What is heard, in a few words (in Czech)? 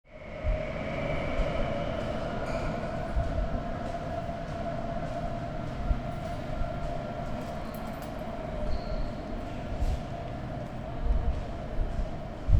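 sireny zkouska, siren test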